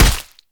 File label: punch